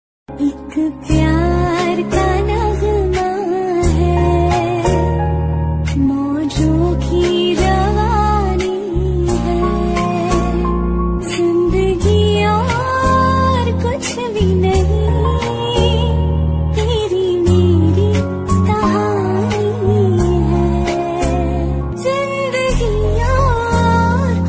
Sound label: ringtone instrumental ringtone love ringtone